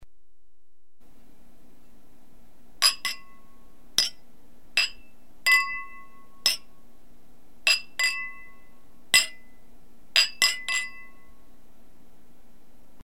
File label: clinking glasses